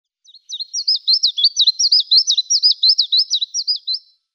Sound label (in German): Taigazilpzalp Phylloscopus tristis; Vogelstimmen